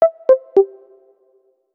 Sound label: alarm